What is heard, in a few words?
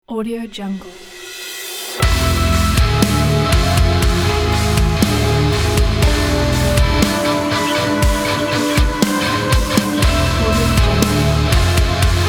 dark heavy metal trailer